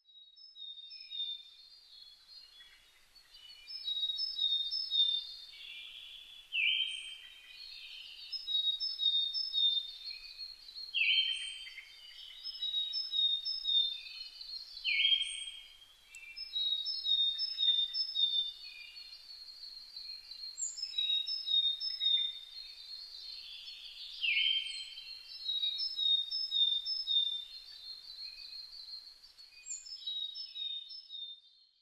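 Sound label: Siberian thrush; mamijiro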